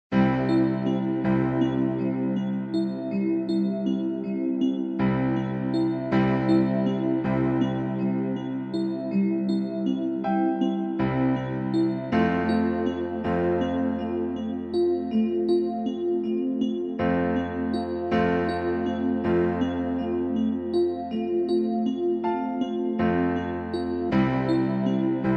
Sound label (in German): Märchenhafte Entspannungsmusik